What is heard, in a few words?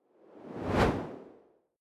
whoosh simple